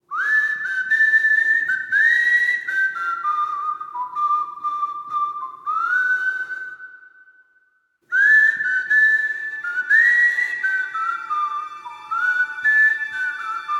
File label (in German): Pfeifen; ringtone